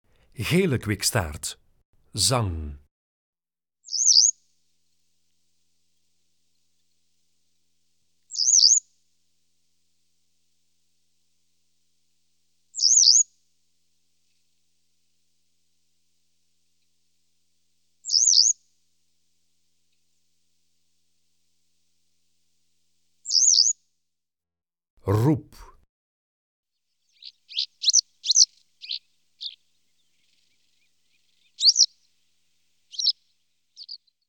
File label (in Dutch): Gele kwikstaart, Zangvogels; IVN